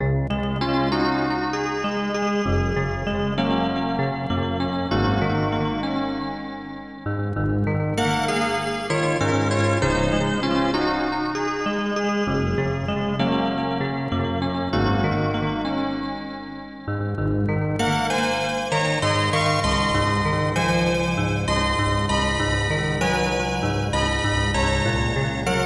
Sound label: Music